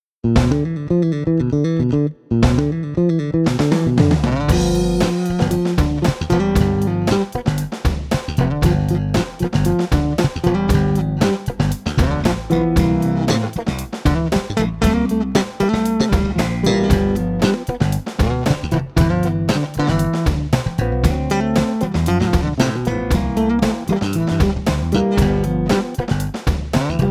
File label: Boogie, bass; suhr classic j 4 string